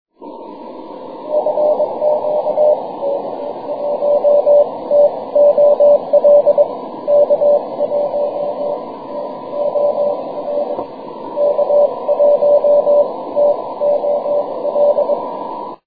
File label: 6m cw